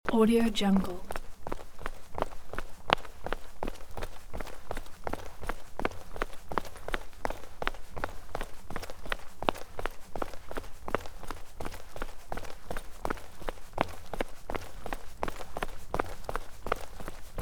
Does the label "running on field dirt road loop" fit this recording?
Yes